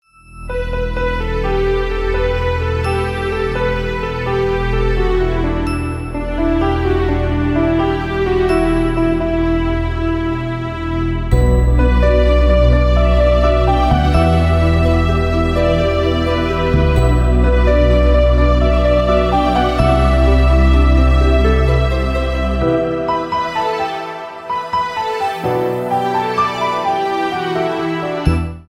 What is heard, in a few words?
Synth Instrumental Ringtone; sytrus synth